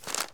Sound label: Paper Crinkle Rustle; SFX Kit; Foley - Misc